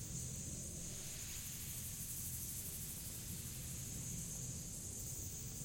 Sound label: stacked Wilds Loop; arcane